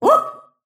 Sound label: move pain; snapfire